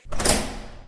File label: door opening sound; half-life